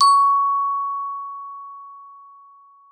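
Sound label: glockenspiel C#4; Xsample VOL-4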